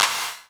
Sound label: CLAPS HOU; Best Service Dance Mega Drums